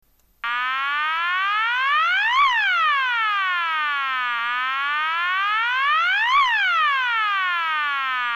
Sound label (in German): ton, Signalgeraete